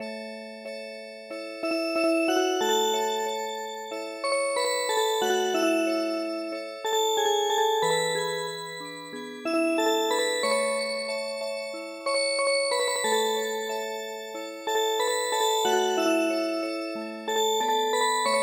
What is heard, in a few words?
Music Box